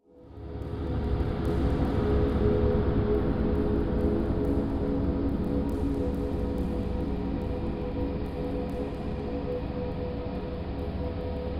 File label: sci fi ambiences sound effects